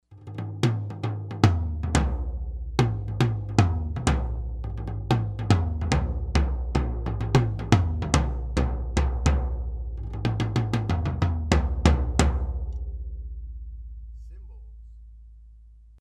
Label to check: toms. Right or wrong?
Right